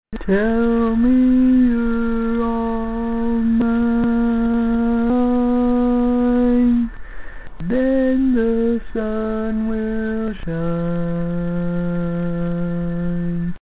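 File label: Baritone, barbershop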